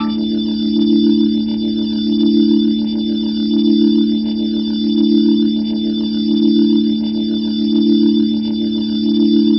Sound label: Glowing Organ A Sus; Ambient Cinematic; Organ Licks